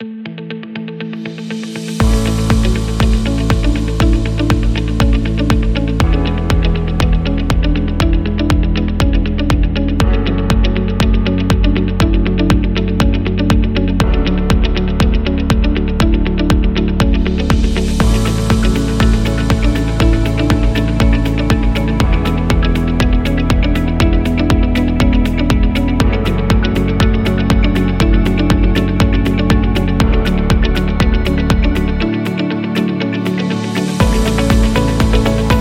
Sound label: Spa, background music